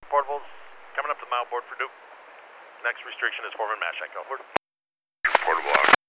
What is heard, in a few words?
Amtrak